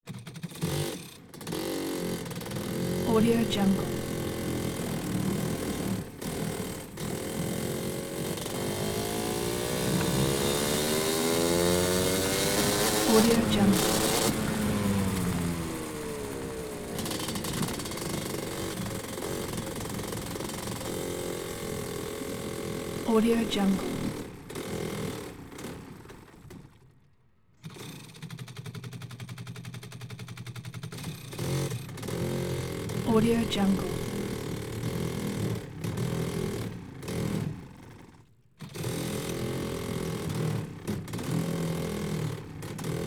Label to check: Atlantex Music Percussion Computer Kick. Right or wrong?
Wrong